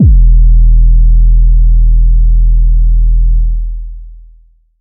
phonk kicks oneshot - 808 e, Specially designed for phonk type beats, these nasty, layered 808 one; kick drum